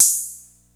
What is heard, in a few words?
GRUVE DRUMS; Rubber Chicken Software Sample Bank